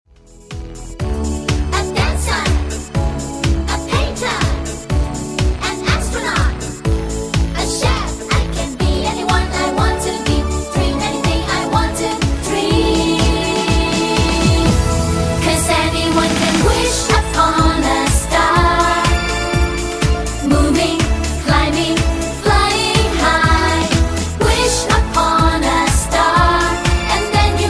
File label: Karaoke